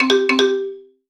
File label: Solo Xylophone Positive; onirism